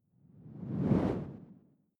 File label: whoosh simple flutter low